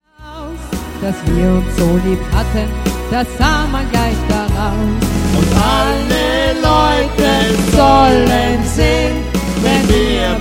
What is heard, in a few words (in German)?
Duett